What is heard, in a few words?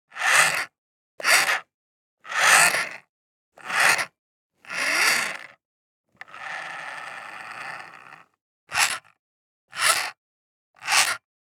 Servo Motor